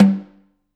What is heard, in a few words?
K2 room Snr Off